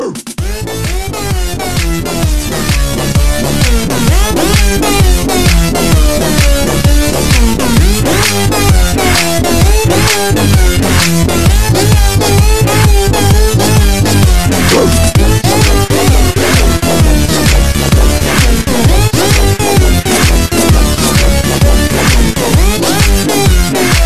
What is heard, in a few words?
Big Bass